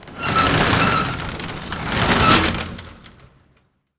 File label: SHATTER; DOORS